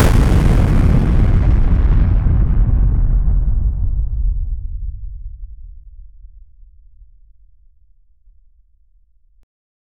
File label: a sudden blindingly loud, apocalypse